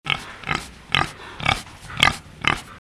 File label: boar